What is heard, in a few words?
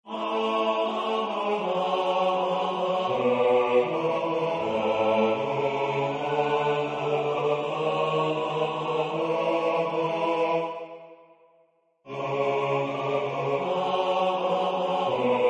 bas; Midsommertid